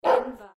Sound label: dog barking